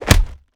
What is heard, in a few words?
punch wall; gmod; arccw uc commons attachments